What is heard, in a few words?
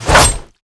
Corps attack